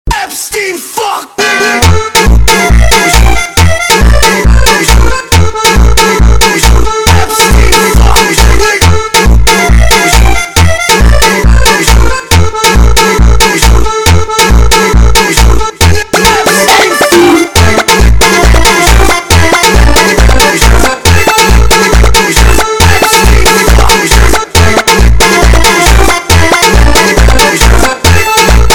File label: Funk sped Up